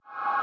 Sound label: ambience